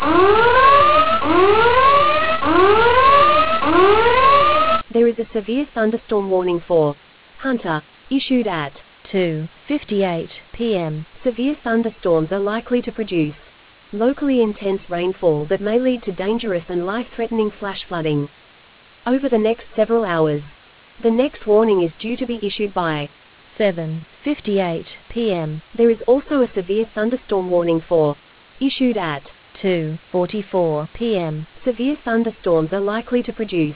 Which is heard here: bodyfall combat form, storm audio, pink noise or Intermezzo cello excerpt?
storm audio